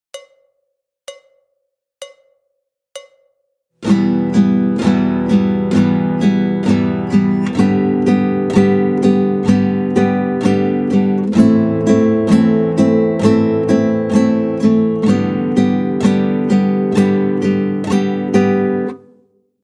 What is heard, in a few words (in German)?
Auf und Abschlag Uebung, Gitarre; Auf- und Abschlagübung 2